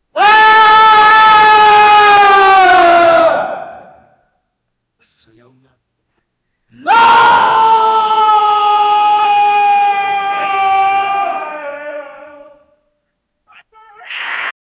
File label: men screaming creepy; sound effect